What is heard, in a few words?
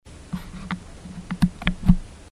clickies